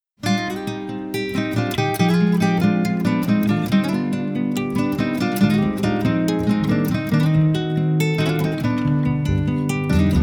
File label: ( Guitar Version )